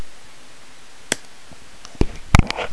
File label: single tap sound